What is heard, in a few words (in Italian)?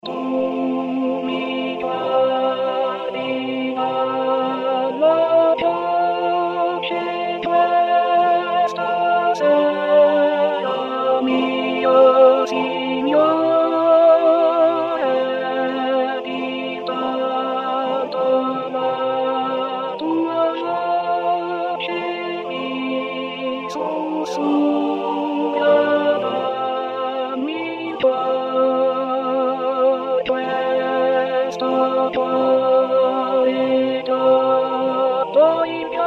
contralti Tu mi guardi dalla croce